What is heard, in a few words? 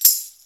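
tambourine; PERC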